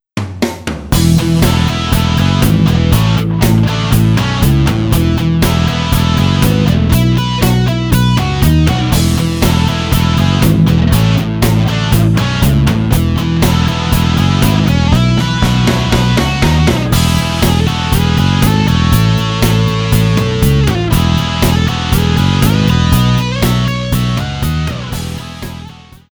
patch demo

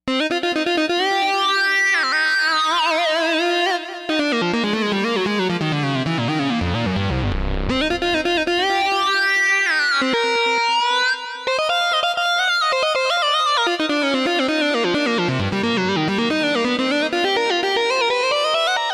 lead; jupiter-80 attaboy lead 3

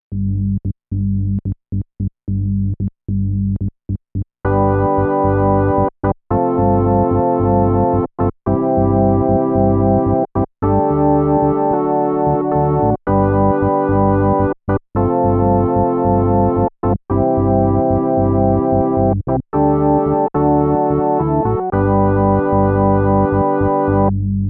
Organ I

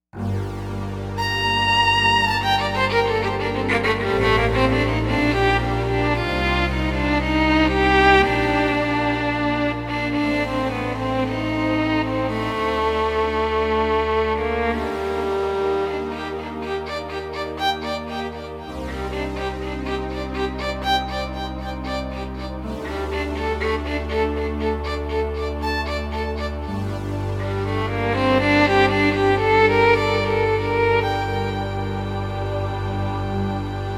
new age violin; Jupiter-80

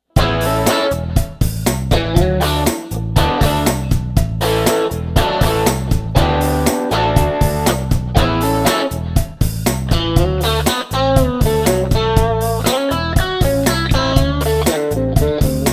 stone roll

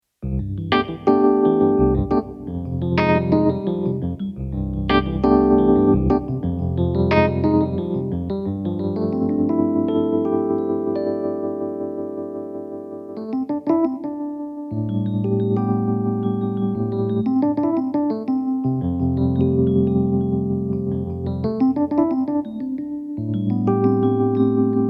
vr-09 tremolo ep

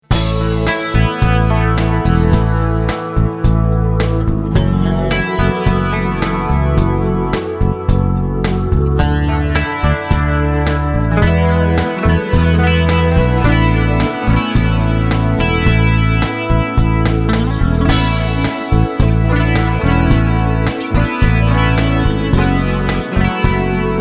space clean

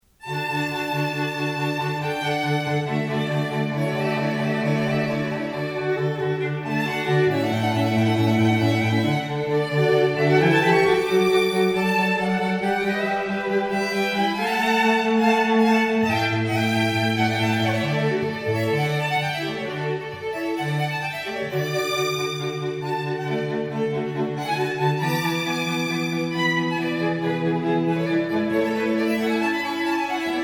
quartet close mic

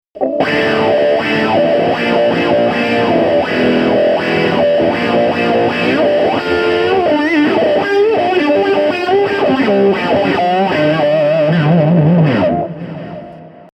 wah

